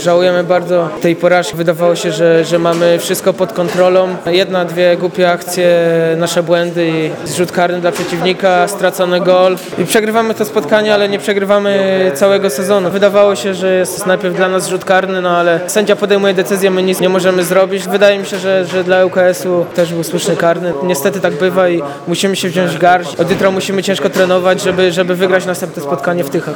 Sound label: fart